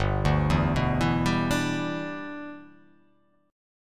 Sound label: G 6th